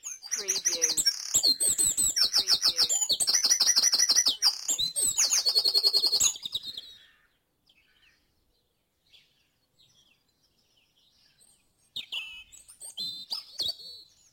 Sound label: Dawn Chorus in Mold North Wales - Short Loop; beanstalk audio